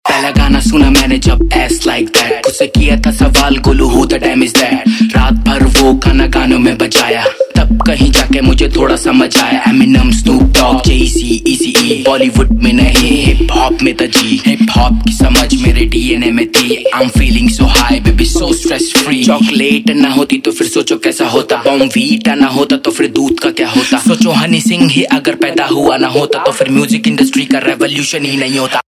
Hip Hop RAP Ringtone; Indian Pop

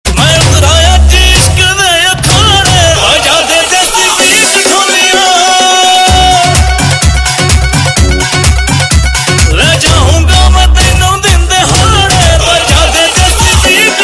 Bollywood Punjabi Pop; Bodyguard

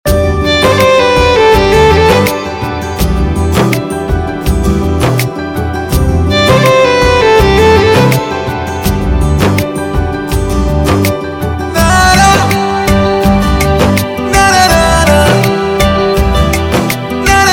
Piano Theme Ringtone; Bollywood; Bareilly Ki Barfi

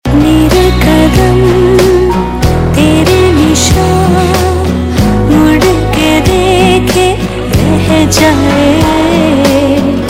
Ringtone

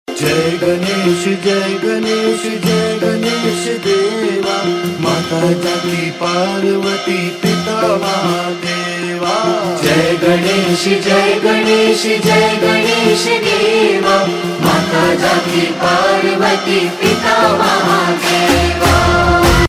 Ganesh Aarti Ringtone; Bollywood; Bhoomi

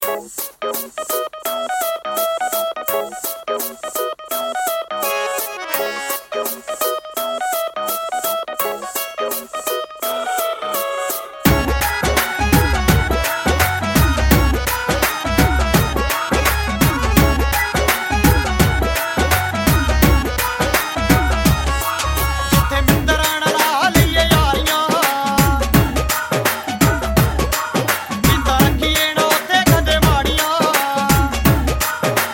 Punjabi; Punjabi Bhangra; Italy

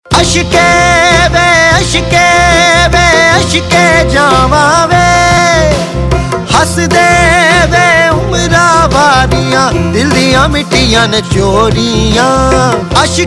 Punjabi Version Ringtone; Bollywood; Phillauri

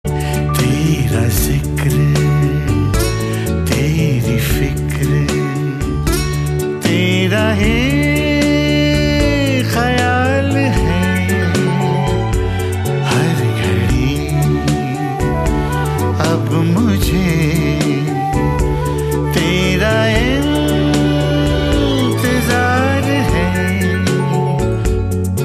Male; Bollywood; Rajdhani Express

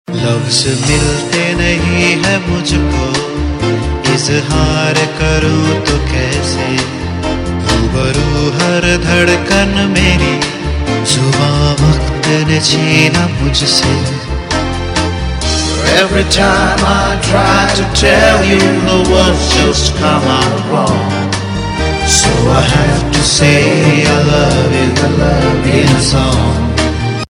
Male; TV Serial Tone; SAB TV

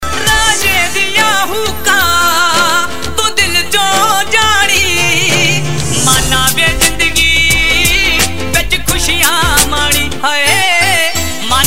Punjabi Ringtone

